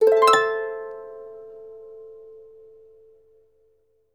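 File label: harp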